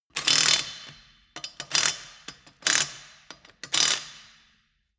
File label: impact wrench screw fast sequence